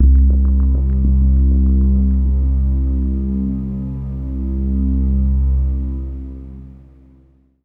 Sample+Hold Drone C; Trance Formation; Sequences

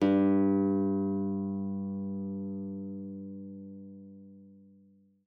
guitar G2; Xsample VOL-2

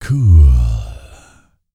Vocal Planet R&B Dance BASS CATCH

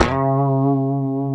D 3, guitars; COMPR STRAT; Spectrasonics; Hans Zimmer Guitars VOL-1

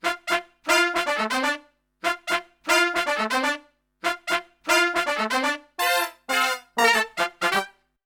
POP F, Brass; Best Service; Brass Super Section; POP LICK

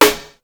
TR1 snare; Perc & Kits; PlatinumPhattCD1; E-MU Producer Series Vol. 8 – Platinum Phatt; Mo Phatt

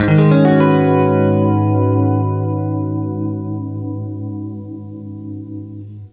CLEAN G#M9, Guitar; East West; Steve Stevens Guitar Samples Collection

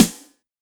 BEAT SD

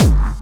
hardbassdrumz, breakcore; Bazzdrumz

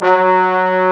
BONES, Sweet Brass; E-MU Producer Series Vol. 2 – More Studio Essentials; Composer

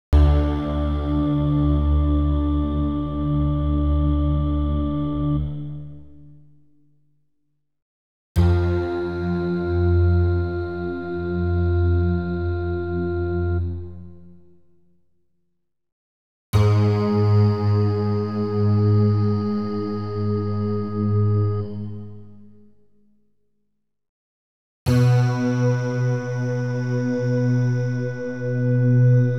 Guitar Atmo D+1; Klaus Schulze Collection

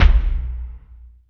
DB TOMS; Greytsounds; Scott Peers Sound Engineering

